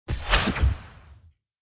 boost; chicken

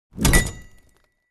power Up Loaded; tntbomb

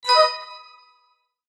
xylophone positive; jewels

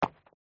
attach; 2048

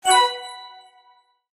xylophone positive; jewels

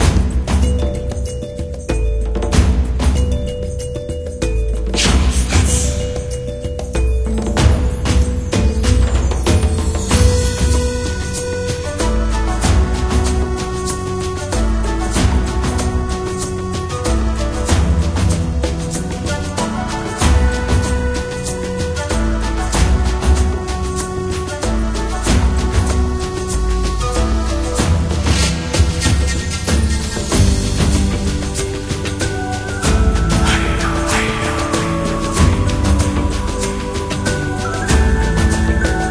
music; jewels

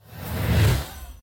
bomb charge; tntbomb

